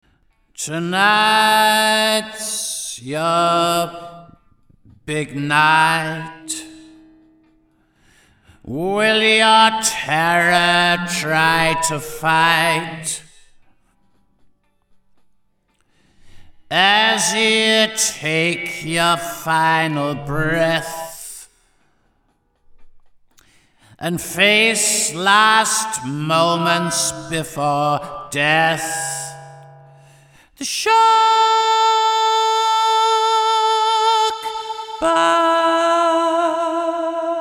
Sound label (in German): Gesang DELAY; LXP-1